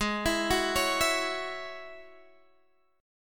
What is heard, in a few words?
Ab 7th Suspended 4th Sharp 5th Ab Guitar Standard; Ab7sus4#5; x,11,14,11,14,12; arpeggiated; chordshape; JGuitar